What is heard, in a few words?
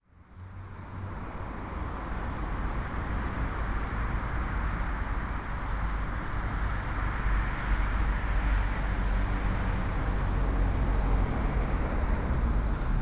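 krasnogorskaya square, sergiyev posad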